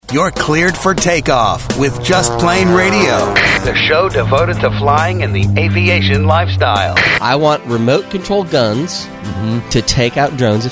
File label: Drones; JPR Promo